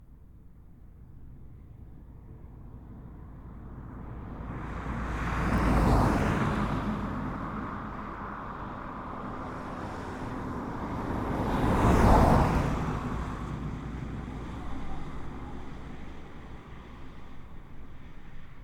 Cars, Vehicles, traffic, road, rural, wave, moderate speed; MKH8040; Pass-by; Sound Sower